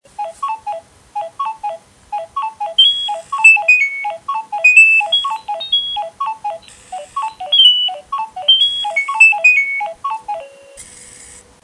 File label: Hurdy gurdy